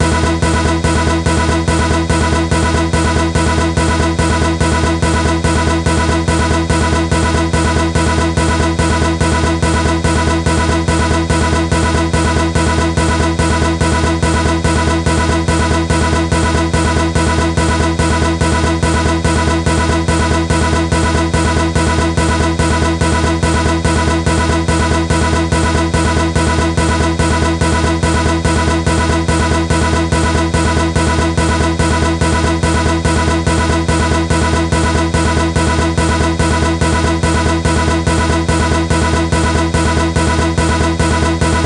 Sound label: Get Ready Loop; Boulder Attack 2